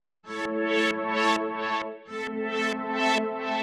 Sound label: 132 A, uk garage, Synths; Solipsa; 132bpm Lines n Loops